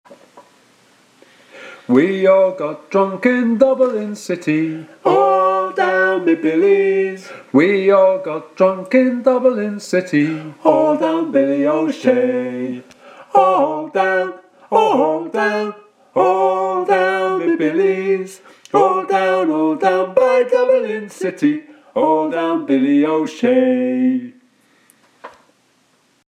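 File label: soprano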